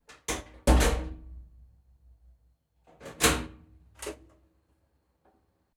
Police Ford Transit Van Cell Door, UK Police Vehicle; InMotionAudio